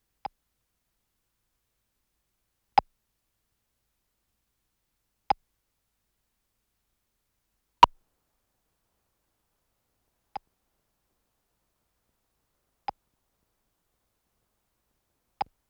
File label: Tape Click